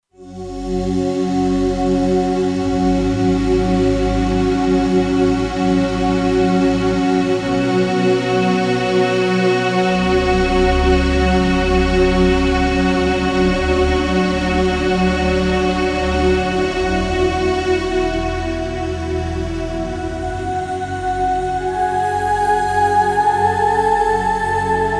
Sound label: Launch Pad, Kurzweil K2500X; Factory Programs